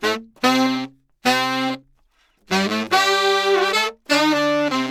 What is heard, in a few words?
saxes